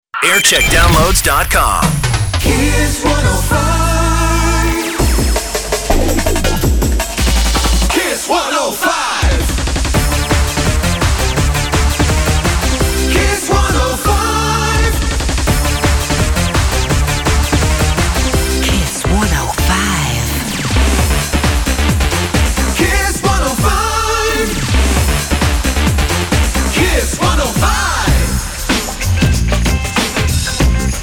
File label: jingle montage; kiss 105